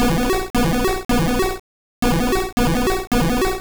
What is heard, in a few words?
Hydro Pump; sfx; attack moves; Pokemon; gen 1; rby